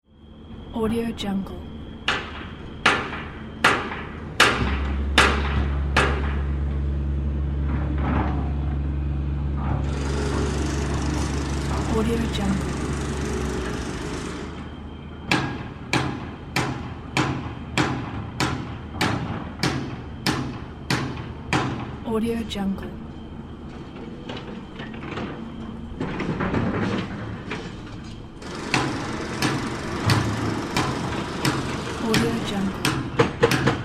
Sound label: construction demolition sound